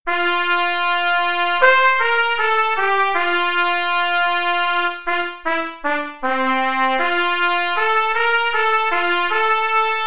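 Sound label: trumpet slow; middle school; Region 23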